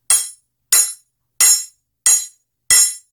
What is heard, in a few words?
craft equip; Adventures in Lestoria; action RPG game; Sig Productions